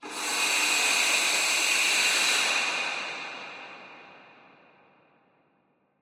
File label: AIR HISS RELEASE DISTANT; SCI-FI; PMSFX; Foundation Series SCI-FI vol 2